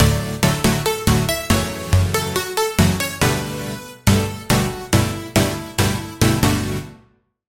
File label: Key